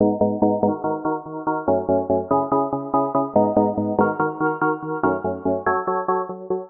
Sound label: D# Minor 143 bpm, pads; MIDI Clips; Tonal; Eidolon; Skitter and Step; Ableton Factory Packs